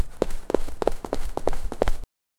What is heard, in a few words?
a person is running and